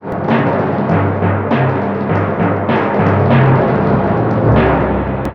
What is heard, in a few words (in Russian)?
litavri